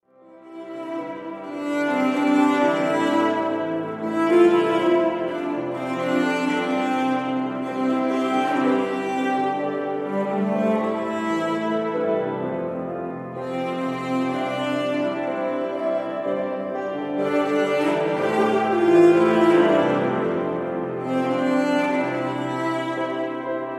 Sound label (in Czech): cello